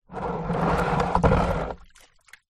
plastic tub large shallow water inside drag concrete; foley; zapsplat